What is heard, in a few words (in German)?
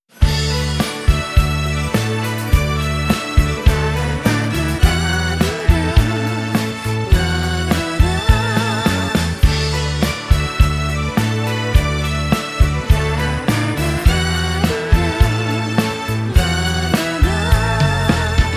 Violine Rumba